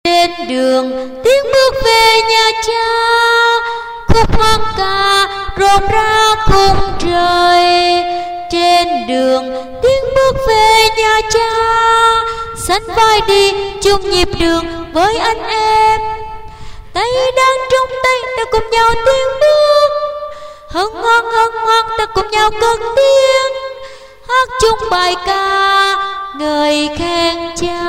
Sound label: tenor basso